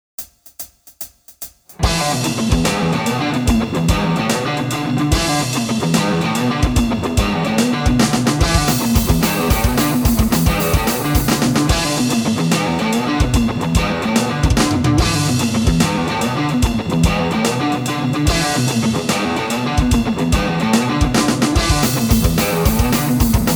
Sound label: riff